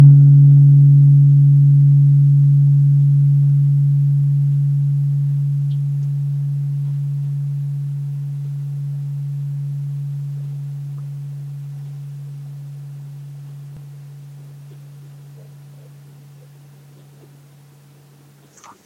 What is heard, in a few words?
throat, tuning forks chakra weighted